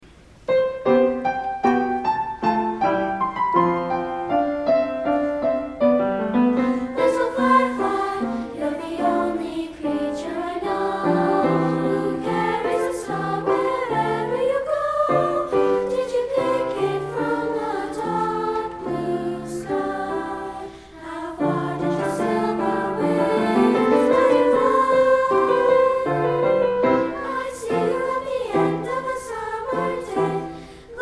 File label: Treble Choir Anthem